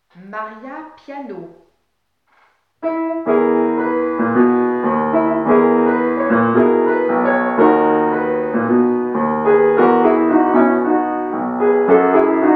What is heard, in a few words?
Piano